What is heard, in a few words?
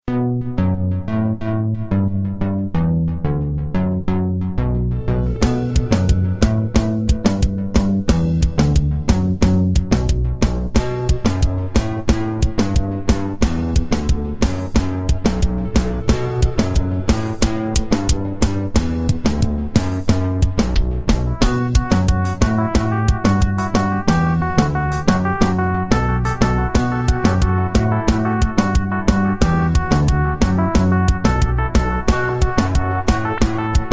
Music; fungus